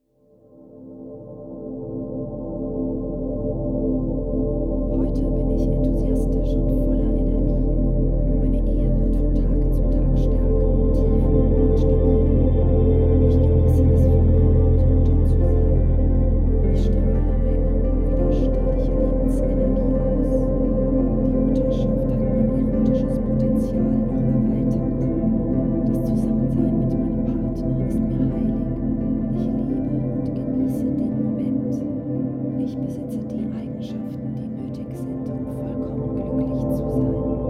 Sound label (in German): Meditativ